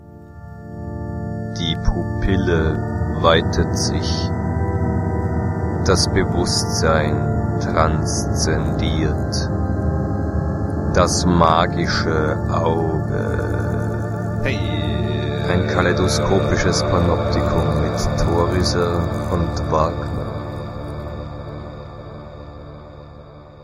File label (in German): Signation lang